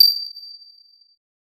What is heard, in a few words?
counter strike style case